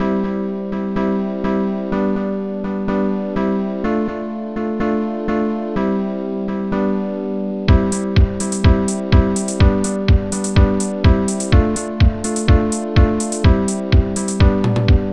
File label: claves; da capo vol1; thom